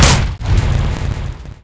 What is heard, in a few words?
flare on; weapons